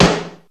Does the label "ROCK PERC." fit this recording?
Yes